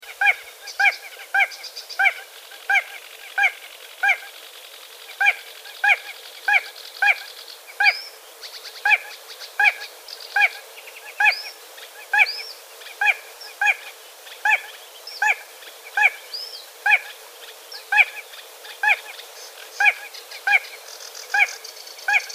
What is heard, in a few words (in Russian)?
malyy pogonysh golos